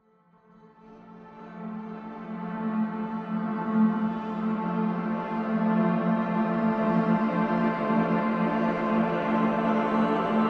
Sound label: DRONE; escape academy